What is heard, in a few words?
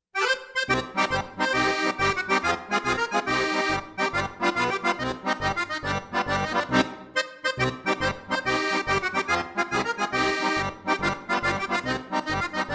Accordion, Itallian Song, Tarantella